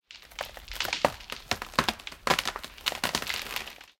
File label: tree creak; War Thunder